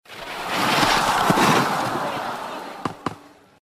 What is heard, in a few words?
wrong jump